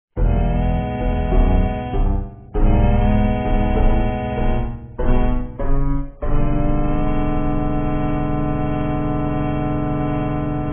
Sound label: horse race